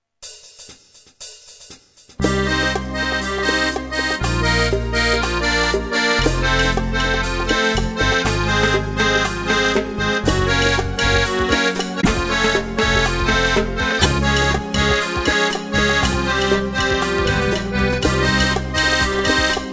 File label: guitard; Contre-temps + percu; Pas de paroles